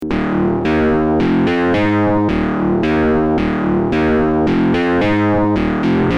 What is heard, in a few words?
ringmod; synths